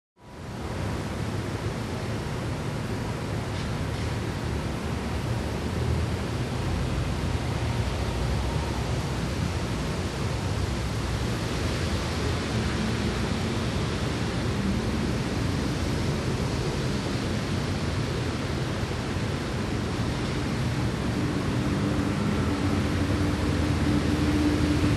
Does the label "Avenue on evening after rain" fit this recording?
Yes